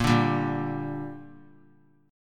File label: A sharp 6th; strummed; JGuitar